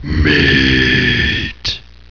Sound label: kill; svencoop; hunger; hungerbarney